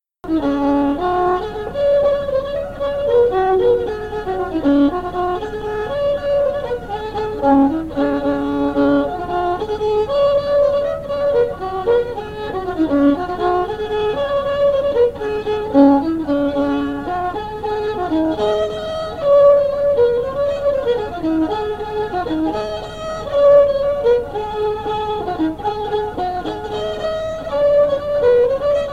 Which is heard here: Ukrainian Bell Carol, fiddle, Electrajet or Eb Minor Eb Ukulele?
fiddle